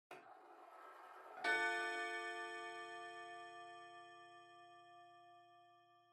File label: Pendulum Clock Chime Far Short